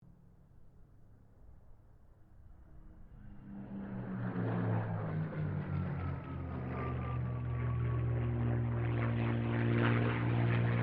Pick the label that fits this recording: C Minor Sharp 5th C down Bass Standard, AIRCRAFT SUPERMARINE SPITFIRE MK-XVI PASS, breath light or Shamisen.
AIRCRAFT SUPERMARINE SPITFIRE MK-XVI PASS